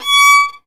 GEIGE, Alpine Volksmusik

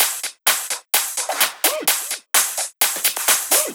Drum Loop 128BPM FULL ohne BD

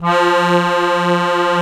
DIATONISCH, AKKORDEON, Alpine Volksmusik; Best Service

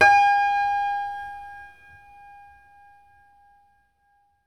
HACKBRETT G#3; Best Service; World Colours

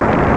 donner; populous; Amiga Soundtracker Sample Packs

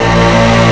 chords moll; Amiga Soundtracker Sample Packs

